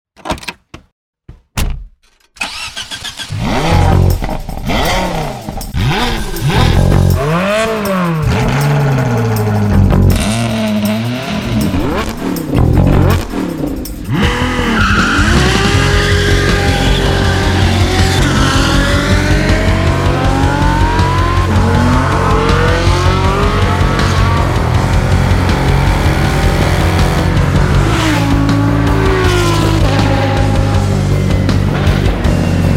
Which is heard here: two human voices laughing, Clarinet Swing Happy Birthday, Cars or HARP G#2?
Cars